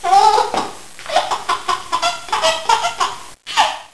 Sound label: laughing